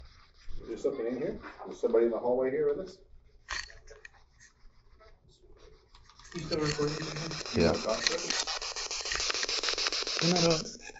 5th Floor Walking Spirit Box

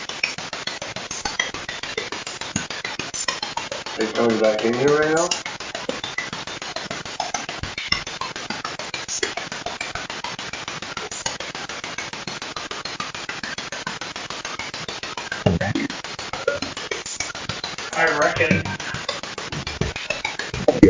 2nd Floor Middle Room; wexford general store antique shop return investigation

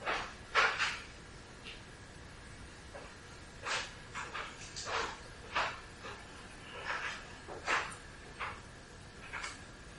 Attic Small Room EVP

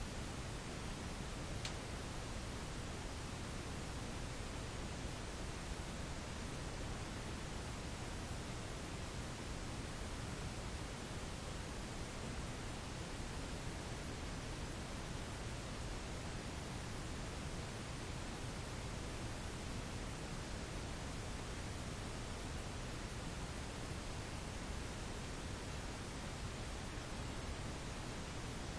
Tattoo Shop Static

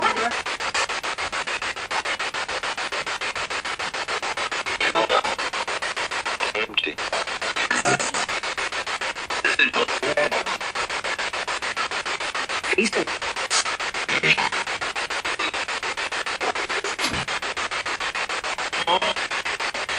Spirit Box; Hallway; private residence freedom road apartment investigation return

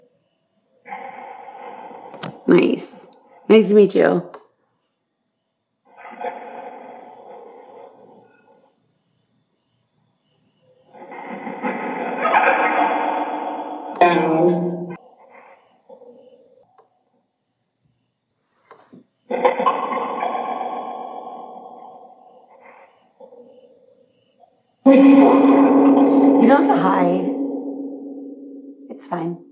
Train Station Chessies Room Phasma Box; Mars Train Station – Return Investigation 2024

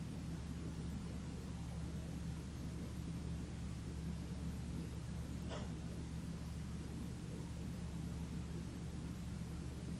Inside Tattoo Shop

